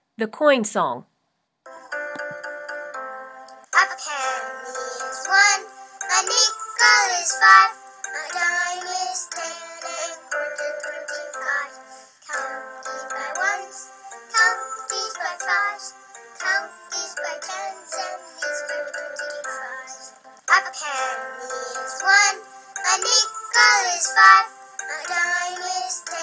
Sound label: Coins Song; Math Jingles; Hymns & Memory Work